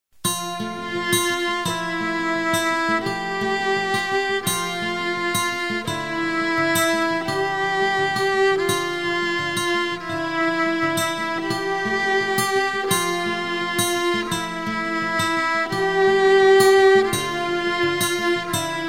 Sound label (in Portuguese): araras violino flauta